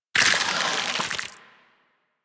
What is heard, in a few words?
Draw A; Warframe; Weapons; Infested; Inf Cernos; Prime Derelict Cernos